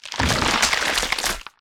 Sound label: behemoth gore; factorio 2.0.55